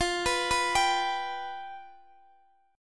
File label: F Suspended 2nd Flat 5th, Guitar, Open D